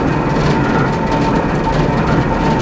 grinder, ambience; narshaddaa

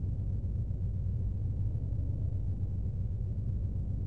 ambience Cave; Star Wars Obi Wan; XBOX

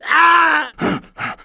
HURT; TrueCrimeStreetsOfLA; XBOX

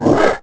roar

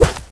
Bullet Dirt, Impacts

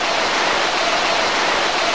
crowd

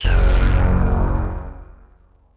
power Up

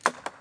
golf ball hit tree; ProStroke Golf World Tour 2007

